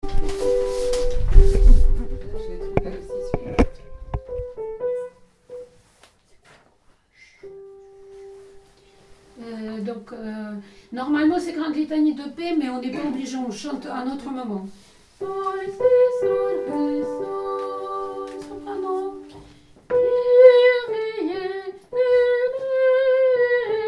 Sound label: Kyrie soprano; ectenie paix